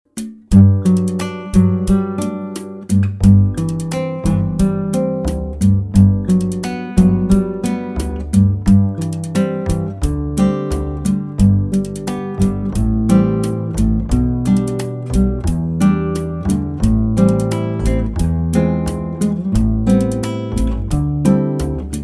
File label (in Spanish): Pista instrumental sin melodia